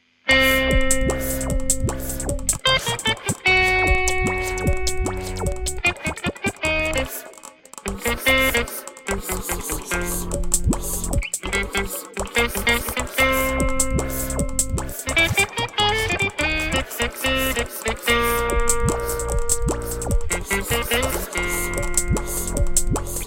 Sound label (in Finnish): kitarasyna; guitarsynth